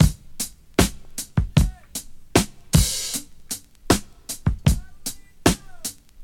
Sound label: 77 bpm drum beat d sharp key, kick tuned to the D# note